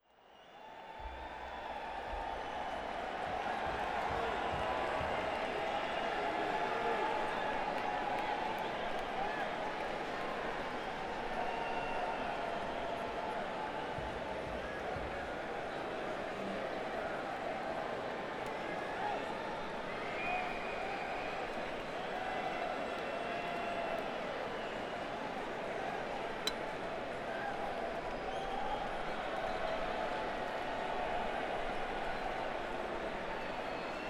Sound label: Crowd, Boardwalk Hall; Atlantic City, NJ